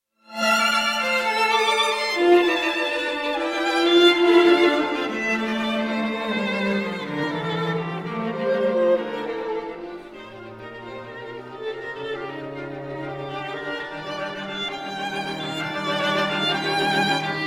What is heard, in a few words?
quartet for strings op 10 in g minor 1st movement anime et